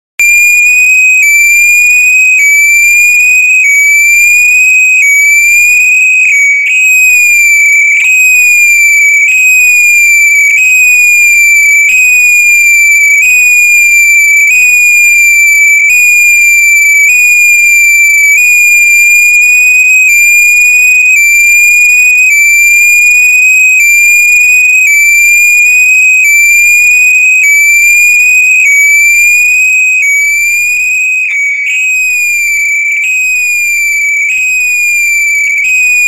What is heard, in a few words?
Morning Noise Broadcast